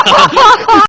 risa malefica

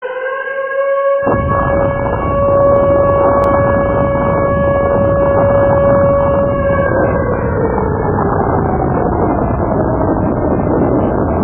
alarma de guerra

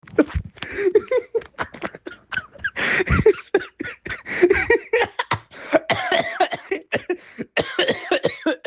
risa

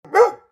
latido unico

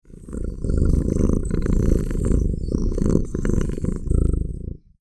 ronroneo

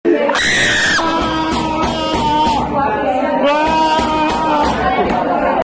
grito

